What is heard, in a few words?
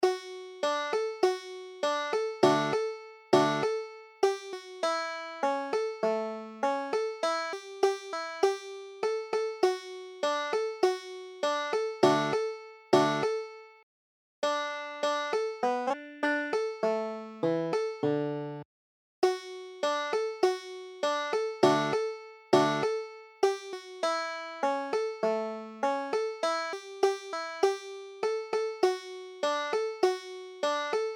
banjo